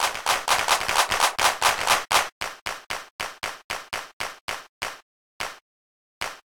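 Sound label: music applause; Undertale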